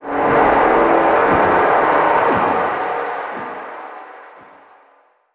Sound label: roar; Hollow Knight; gg shadelord